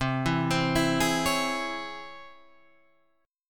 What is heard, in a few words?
Db Minor Major 7th C